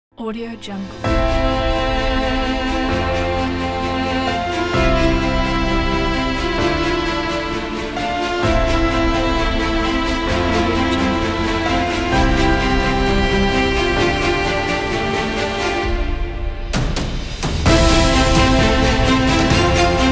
for epic

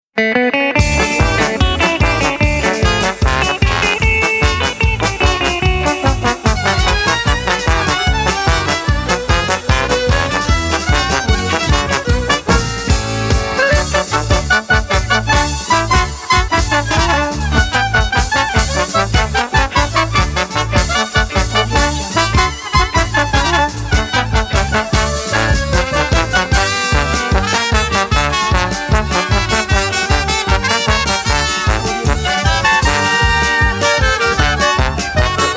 jewish dance; soundbrothers

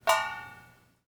water drip into pot lid with light ring off; prosoundfx

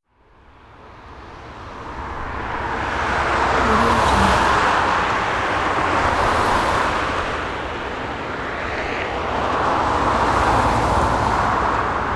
urban car traffic atmosphere

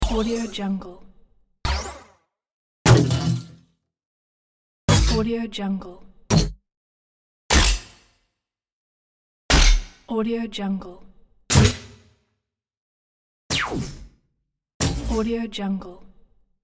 designed game futuristic gun shot

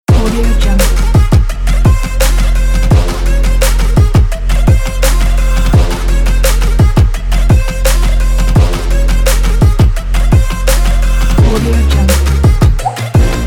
arabic trap music

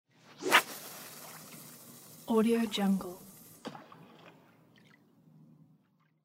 fishing

